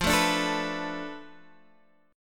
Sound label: F 6th Flat 5th F Guitar Open G